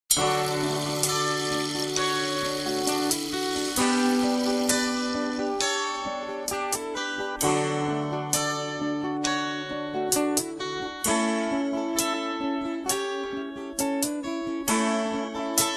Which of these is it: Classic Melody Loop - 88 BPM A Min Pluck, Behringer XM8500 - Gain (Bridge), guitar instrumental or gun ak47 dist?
guitar instrumental